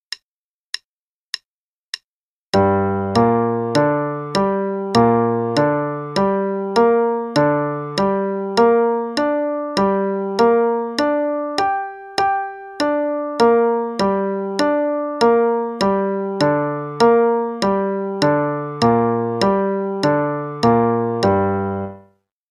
Broken Triads to the Octave only (g minor, qn=100); root position / and inversions, / up first, then down; CM Level 4; Daily Warm-ups